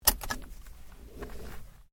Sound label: Seatbelt Off, car; Hyundai Accent 2008; Hyundai hire car; Vehicles